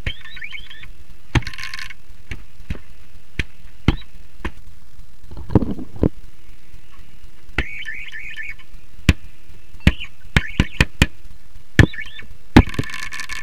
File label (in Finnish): hajotin rikkinäisen kovon pikkuse lisää